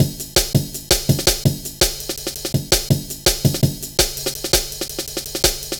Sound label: Drum Loops, 165.5; Zero-G Jungle Warfare 1, 2 & 3